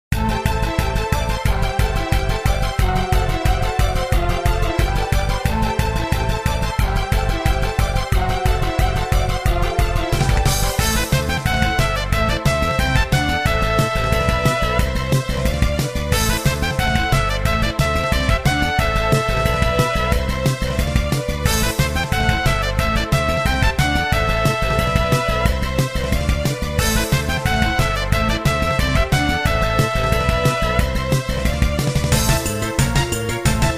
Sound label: Battle; Azur Ring Virgin and Slaves Phylacteries; 兔子